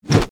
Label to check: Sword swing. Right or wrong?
Right